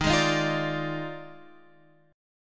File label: D sharp Major 7th Suspended 2nd Suspended 4th Guitar Open F; D#M7sus2sus4; strummed; x,10,8,10,8,9; chord shape